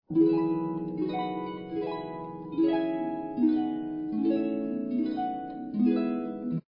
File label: heaven sent, harp